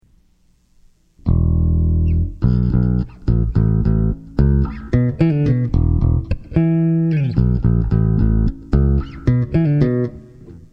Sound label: Bass Spooky